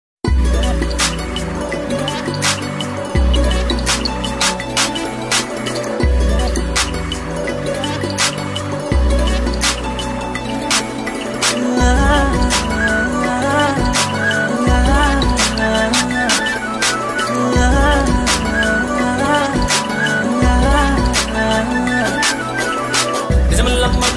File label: love ringtone; ethir neechal